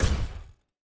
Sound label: Footstep Robot Large; Robobeat